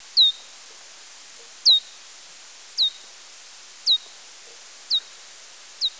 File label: Pipistrellus nathusii